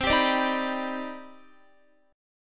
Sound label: C Minor Major 9th C Guitar 4F,4A,5C,5G,5C,6E; CmM9; strummed